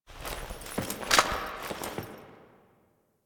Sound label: Fixing Computer Interact; Loid; Warframe; Quests; Entrati Labs